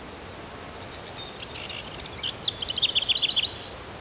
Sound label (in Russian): Zvuk lesa